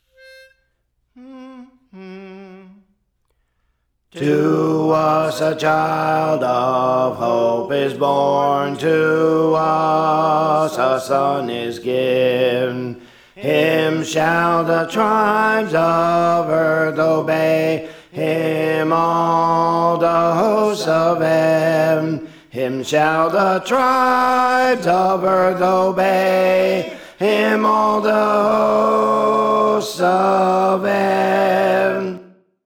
Alto; To Us a Child of Hope Is Born; Praise For The Lord Training

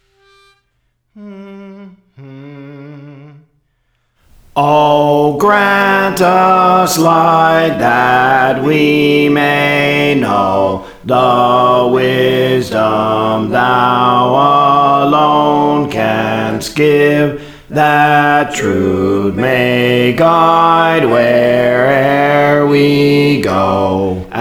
Bass; Praise For The Lord Training

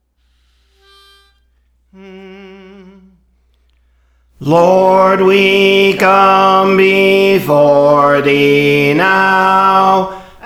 Bass, Songs; Praise For The Lord Training; Lord We Come Before Thee Now

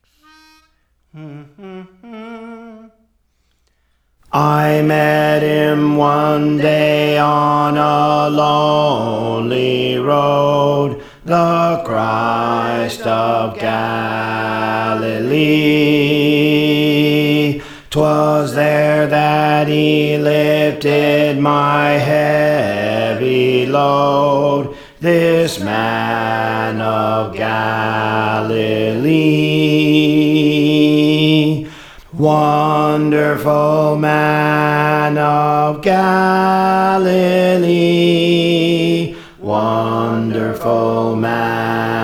Bass; Praise For The Lord Training

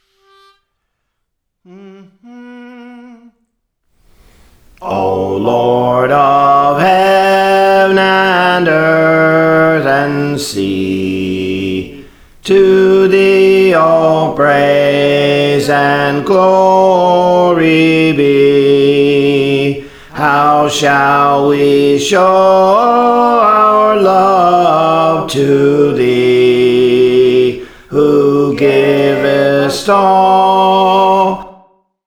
Bass, Songs; Praise For The Lord Training

